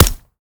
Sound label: fire supp, glock; weapons; arccw ud